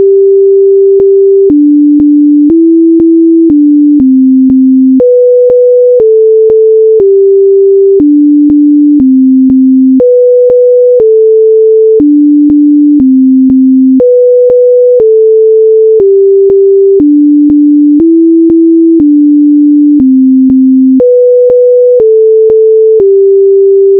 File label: sine